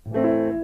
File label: Piano